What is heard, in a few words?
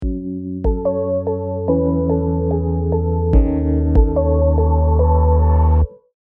intro melody